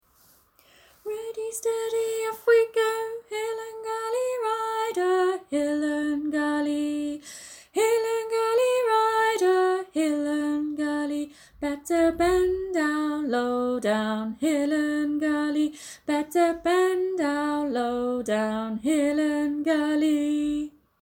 hill n gully song; in harmony teacher resources; eyfs and key stage 1

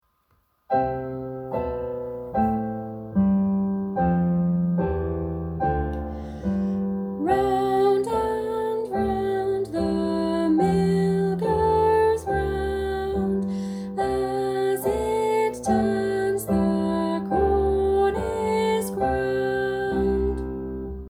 round and round song with piano; eyfs and key stage 1

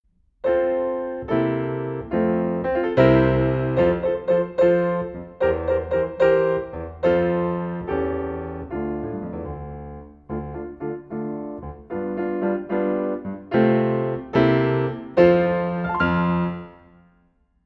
jack in the box live piano accomp; eyfs and key stage 1

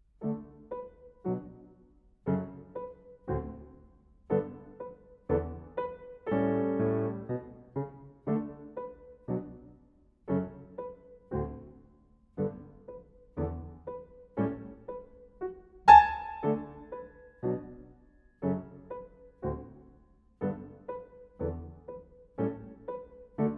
mrs white live piano accomp; in harmony teacher resources; eyfs and key stage 1

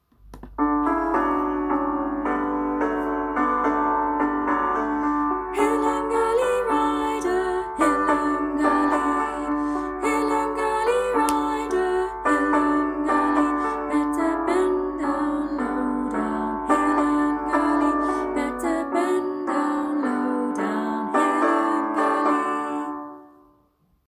hill n gully song with piano; in harmony teacher resources; eyfs and key stage 1; SONG WITH ACCOMPANIMENT

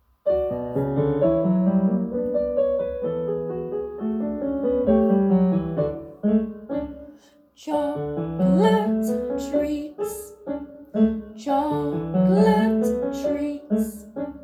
chocolate treats song with piano; eyfs and key stage 1

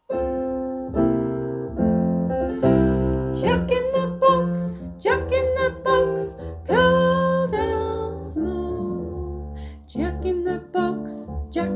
jack in the box song with piano; in harmony teacher resources; eyfs and key stage 1

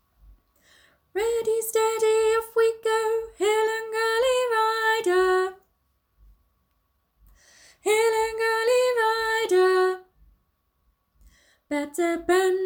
hill n gully song call only; in harmony teacher resources; eyfs and key stage 1

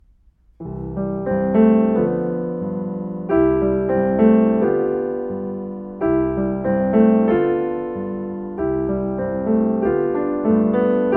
sway tree sway live piano accompaniment; eyfs and key stage 1

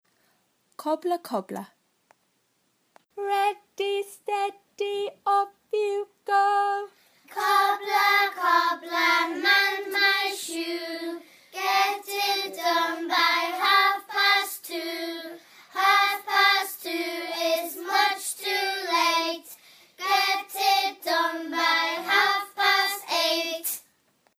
cobbler cobbler song; eyfs and key stage 1

